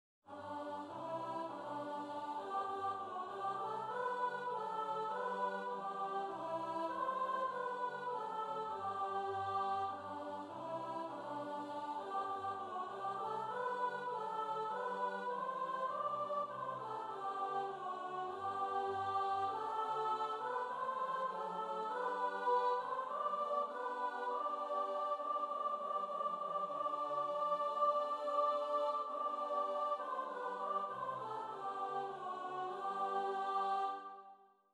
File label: sops, choir